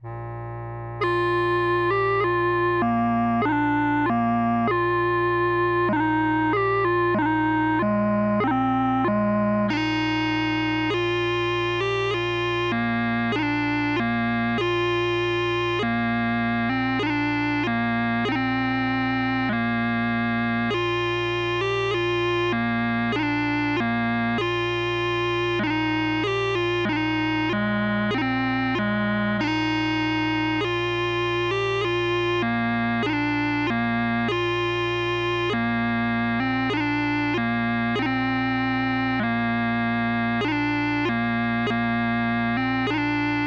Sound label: High Road, Brown Haired Maiden, pipes, 50 bpm; 2/4; Set 1: High Road/Brown Haired Maiden